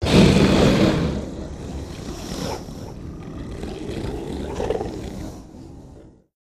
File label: Monster Combine, monsters